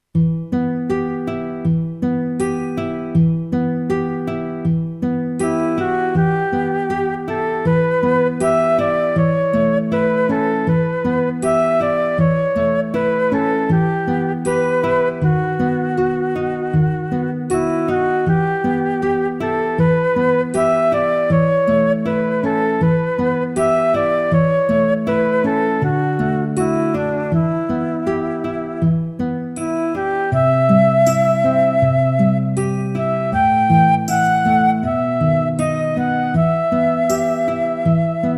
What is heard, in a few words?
Menu Flute; Dragons War Tactics